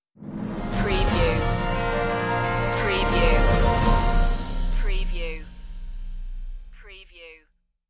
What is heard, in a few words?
news stinger